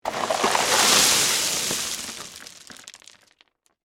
Impact Stones Pour, From, Bin; Rock Sound Effects

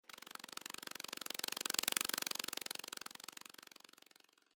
Insect Wings Fly, Insect, Wings, Fly, By, Bug; Sound Effects:Animals:Insects

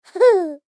Human Giggle, Giggle Male Toddler; Laughter; Voice; Blastwave; Humans

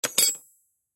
Pipe Drop Rocks Tool Wrench; Drops; Foley; Blastwave

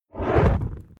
Whoosh Fireball Incoming Flame Heavy Flanging; SFX Title Graphics; Whoosh Sound Effects

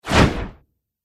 Cartoon Dragon Wing Flap; Monster Sound Effects

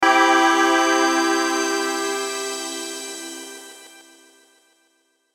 Hit Synth Delay Shimmer; Blastwave

